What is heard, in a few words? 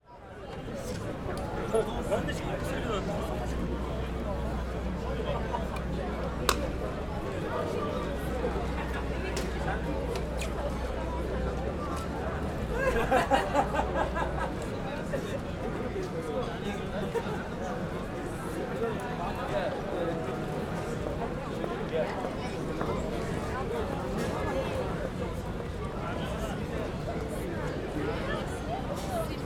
Istanbul, Taksim, Turkish voices, Crowd atmosphere, People passing by; Traffic; Sound Ex Machina